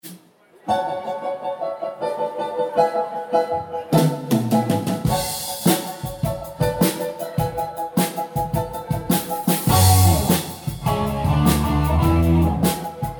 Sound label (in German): reduzierte publikumsgeraeusche